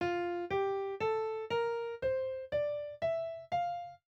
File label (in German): F mit b